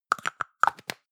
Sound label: plastic can lid screw close hard long; objekte; dose